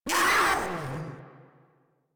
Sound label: Proto Infested pain; Warframe; InfestedNinetyNine